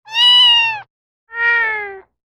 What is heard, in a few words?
call mail; HTC; Infobar-A02